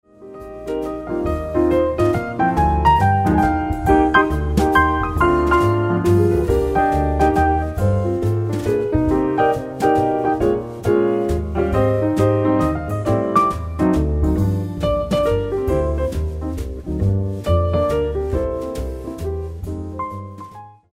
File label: Piano Jazz